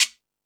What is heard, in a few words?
Jungel Percussion, E-MU Pro-Cussion; drum machine